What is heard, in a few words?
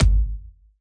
kicks